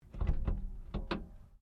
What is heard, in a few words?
Hyundai Accent Bonnett Lift; Hyundai Accent 20 08; Hyundai hire car; Vehicles; Cars